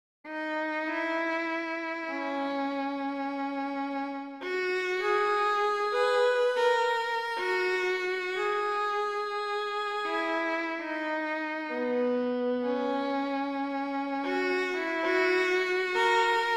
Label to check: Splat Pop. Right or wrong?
Wrong